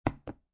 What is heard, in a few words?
Poker Chip Dropping On Felt; Poker, gambling; Cards; Casinos; LynneMusic